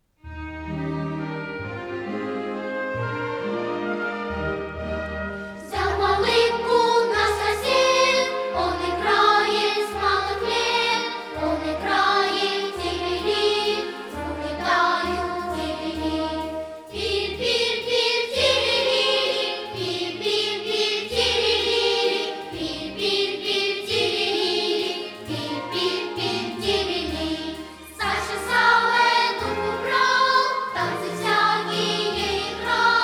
bolshoj detskij hor

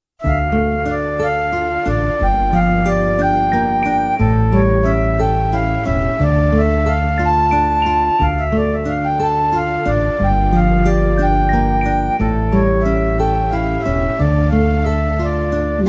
proschalnyj vals

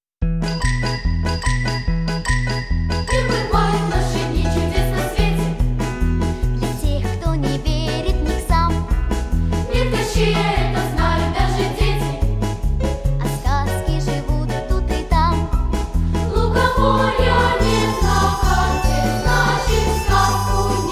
Хор, Песня-спор, минус

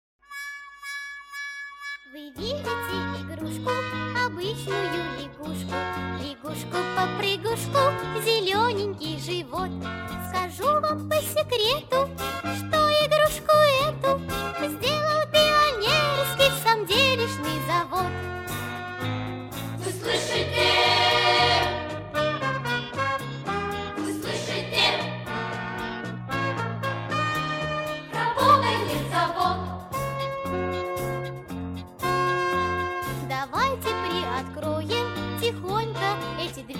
Детский хор, минус

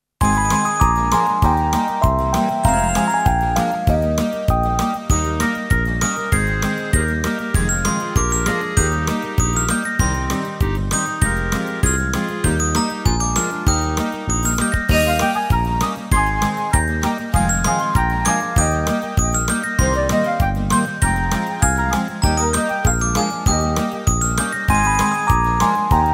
detskie novogodnie minus

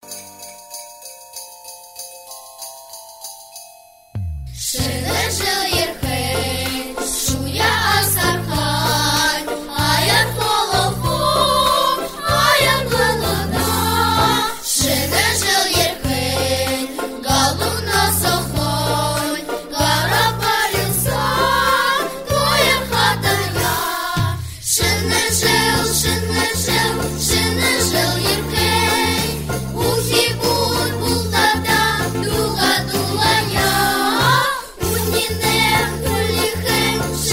jingle bells na burjatskom

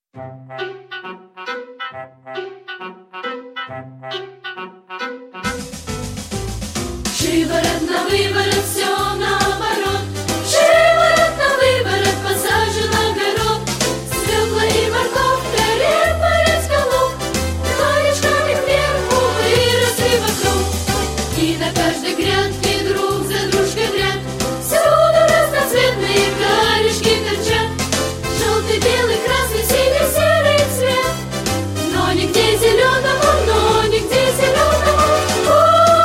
Детский хор, минус

